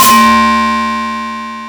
Metalen schop, FM; Polyend

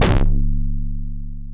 Digi schop, FM; Polyend

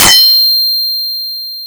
Metalen perc, FM; Polyend

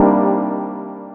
Steek Cmin, FM; Polyend

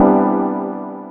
Steek Cmaj, FM; Polyend

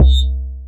schop, FM; Estige; Polyend

